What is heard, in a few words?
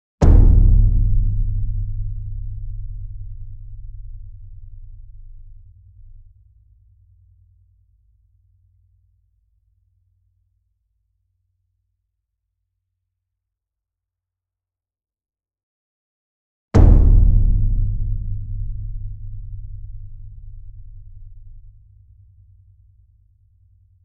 ENSEMBLE DRUM Bass Drum Concert Large Hit Near; boom library; Cinematic Strikes Construction Kit